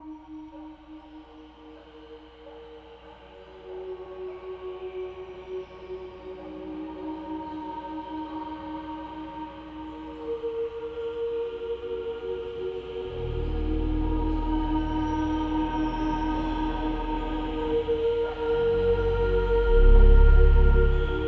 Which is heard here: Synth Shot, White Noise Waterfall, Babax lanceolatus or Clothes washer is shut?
White Noise Waterfall